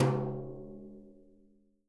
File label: BRUSHES, Living Drums